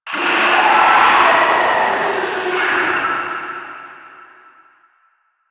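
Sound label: Infested Distant; Warframe; NineteenNinetyNine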